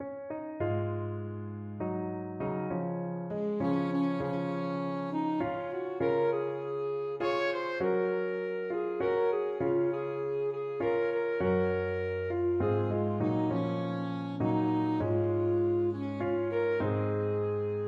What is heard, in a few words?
ASAX, saxophone